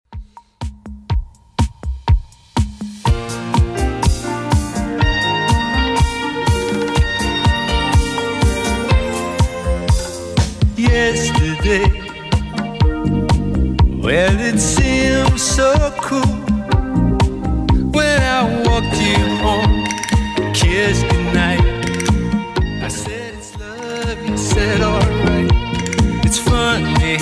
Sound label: 70s Redrum 123bpm